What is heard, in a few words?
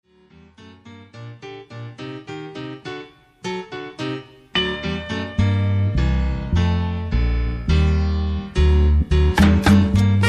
Latin Jazz; Putumayo World Music